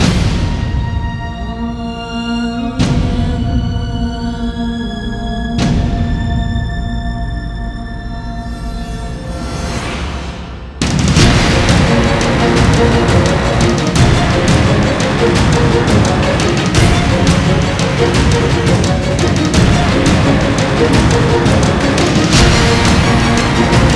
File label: bgm